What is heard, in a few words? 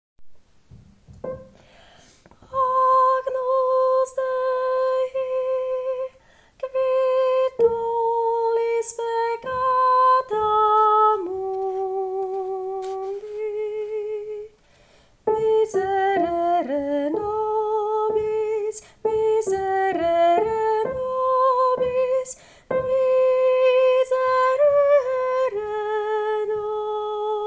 tenor; Agnus Dei; spevokol